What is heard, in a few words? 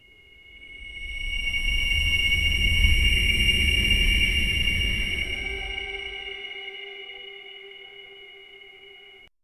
a goblin running sound